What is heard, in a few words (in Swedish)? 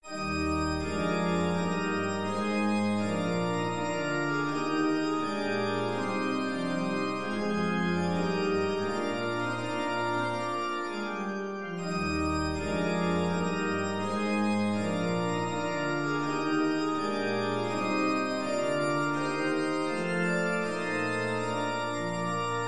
Brollopsmarsch